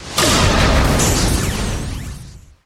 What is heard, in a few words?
Magic Lightning